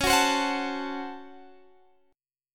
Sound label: C sharp Major 7th Suspended 4th Sharp 5th, C sharp, Guitar, Drop D